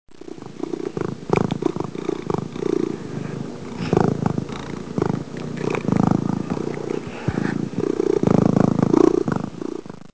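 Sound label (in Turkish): kaplan hırr, hayvanlar; efekt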